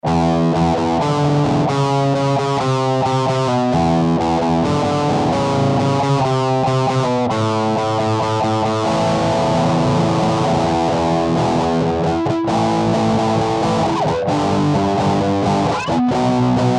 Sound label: STACKED, Digitech RP200 Factory Presets